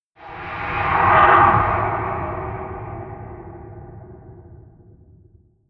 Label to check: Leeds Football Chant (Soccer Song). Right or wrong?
Wrong